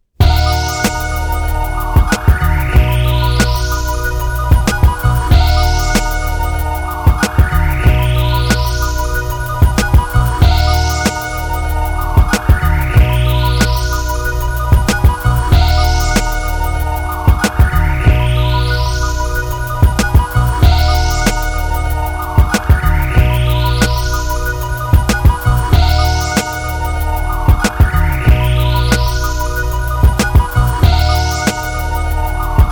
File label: Intro (Instrumental)